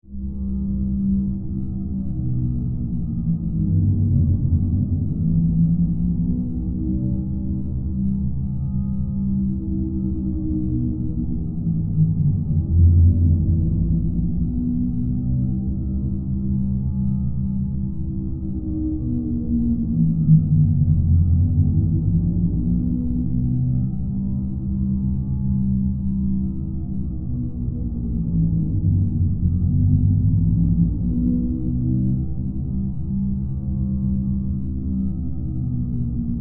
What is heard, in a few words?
Drone Horror, Chime; Drones; BlastWave